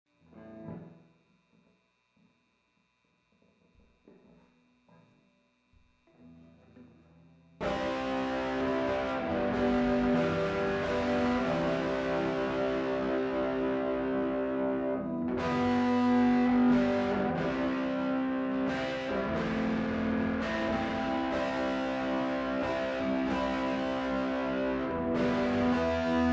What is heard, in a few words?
French Waltz Bass